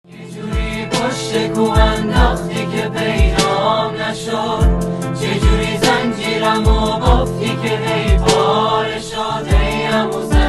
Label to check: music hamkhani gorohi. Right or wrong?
Right